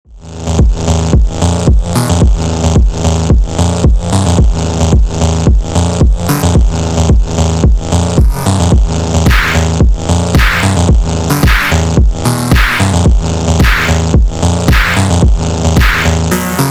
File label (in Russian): Bass slowed, ЖЕСТКИЙ ФОНК